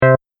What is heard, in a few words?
Incorrect Wrong Noise